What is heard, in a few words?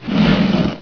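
bar move up; Hexen2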